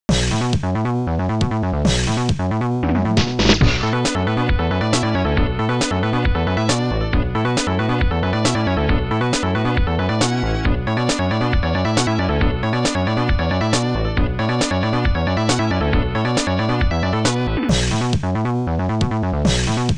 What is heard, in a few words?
Stage BGM Amiga; Street Fighter II; U.S. Gold collection